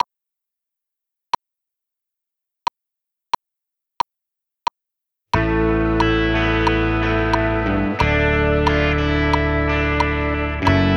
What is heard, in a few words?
Guitar